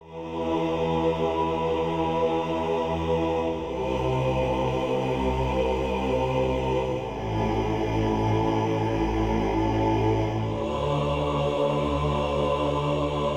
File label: blue male choir; sprunki incredimake